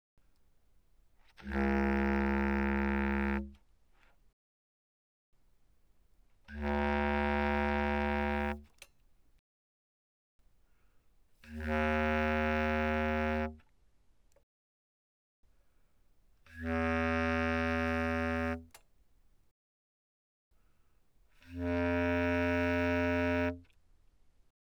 Bass Clarinet mf Db2 B2

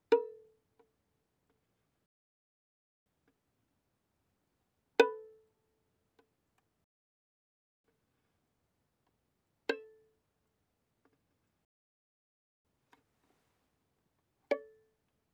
Violin pizz mf sul G C5 Ab5

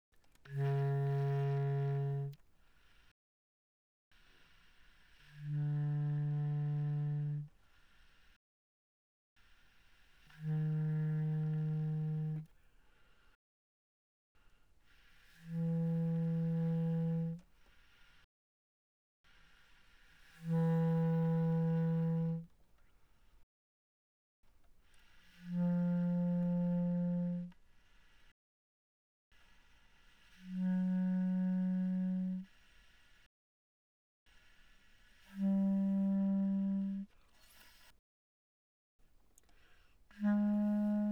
Bass Clarinet pp C3 B3

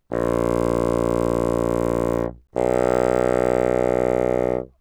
Bassoon ff Bb1 B1

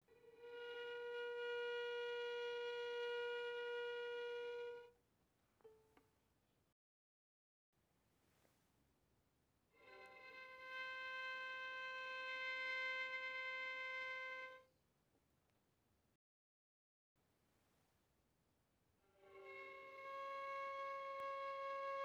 Violin arco pp sul D C5 B5

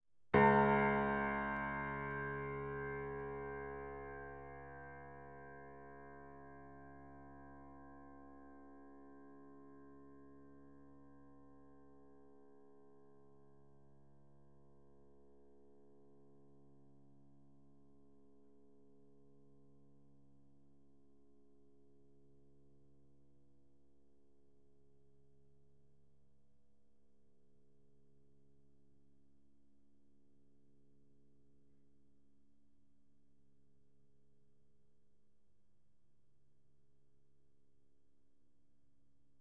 Piano ff Db2

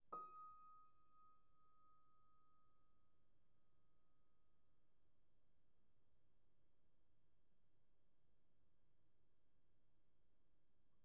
Piano pp Eb6

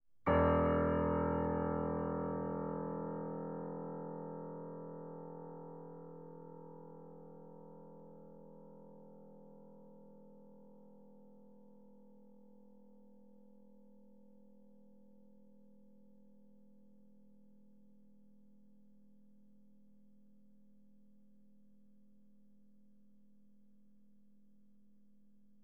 Piano ff E1

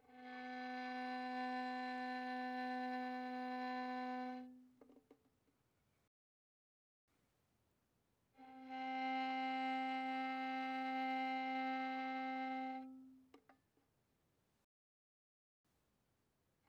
Violin arco pp sul G C4 B4

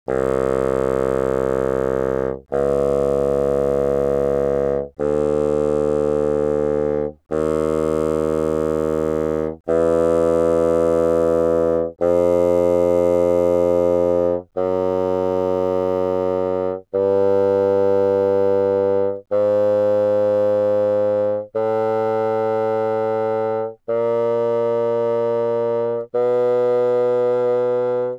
Bassoon ff C2 B2

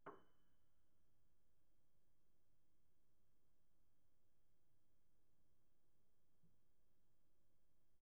Piano pp Gb7

